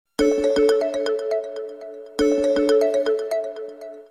message tone

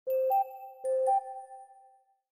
Cuckoo sms Mobile

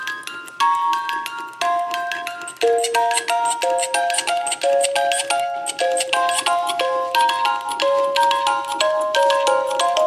music box carol

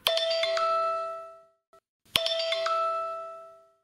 iPhone Bulletin Ios

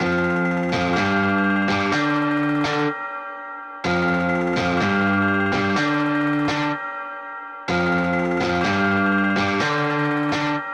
pop rock

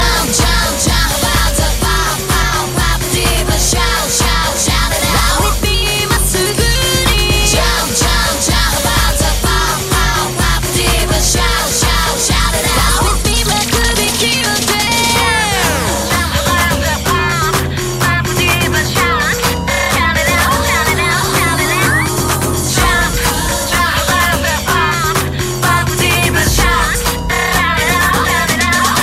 pop diva

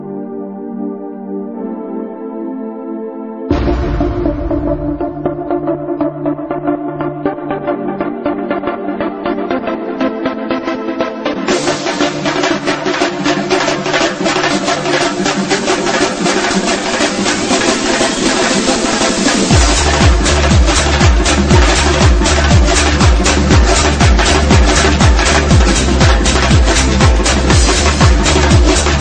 lezginka

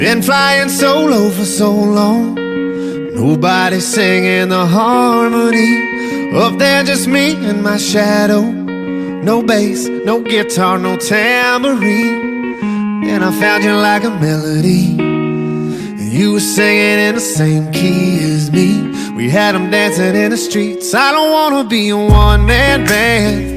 one man band

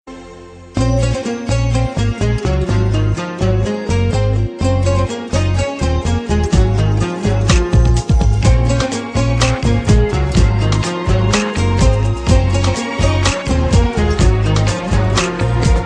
arabian guitar

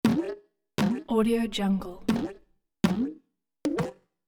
Cartoon Bounce

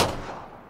Pubg p92

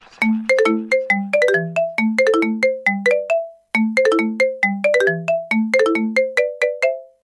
carnival marimba

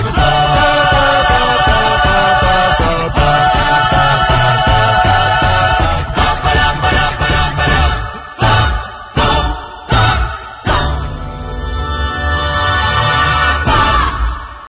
clasical

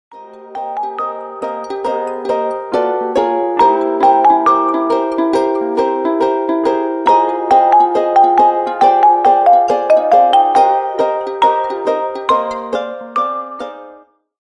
Hawaii Huawei

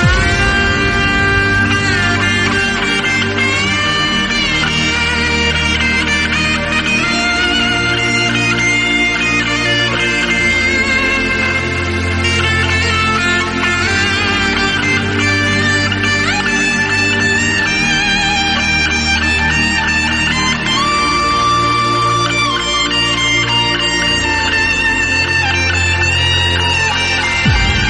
Trance Guitar

